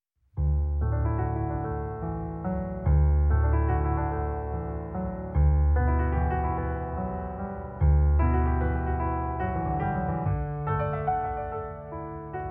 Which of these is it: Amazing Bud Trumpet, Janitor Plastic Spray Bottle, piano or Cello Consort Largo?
piano